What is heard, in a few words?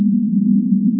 loop Alien Drone